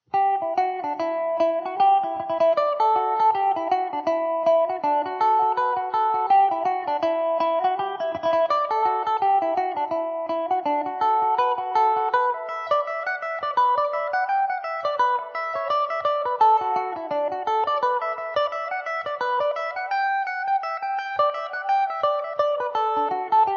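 cabin hunter E dorian played single, irish mandolin; Mandobird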